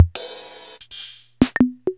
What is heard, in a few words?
Casio MT-800; Sliced